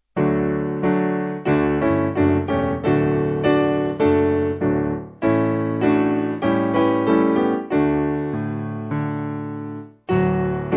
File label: Piano